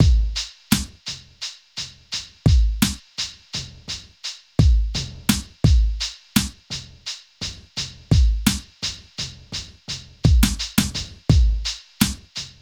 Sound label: HIPHOP, Funky; INIS; Funky Xtreams